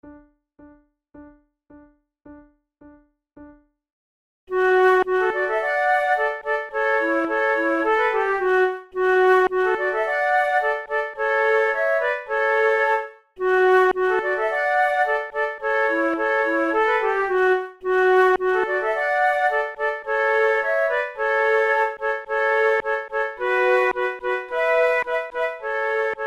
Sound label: trio; weber; der freischutz; the hunters chorus